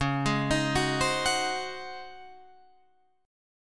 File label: Db Major 7th Suspended 2nd Suspended 4th, Db, Guitar, Open F; arpeggiated